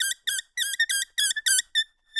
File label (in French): LOOP Couinement Toutou Baudruche Mono